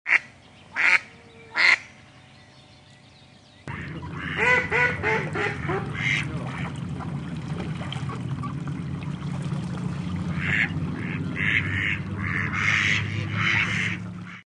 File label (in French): canard colvert